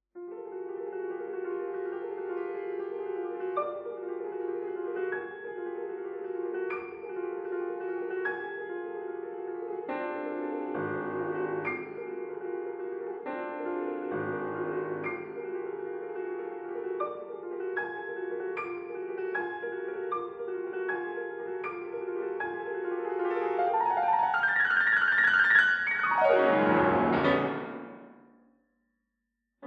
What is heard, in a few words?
Prelude